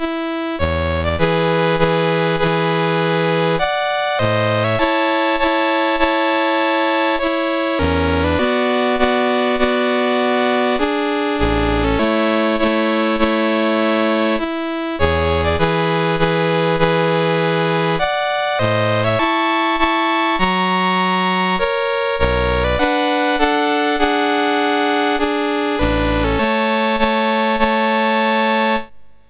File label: Prelude A Major, MOS6581R4; Op28 No7; Dave Marquis